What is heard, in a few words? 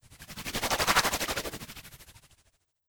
Coral Brinicle Water